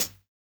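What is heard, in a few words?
lofi hi hat; Drums In Your FACE!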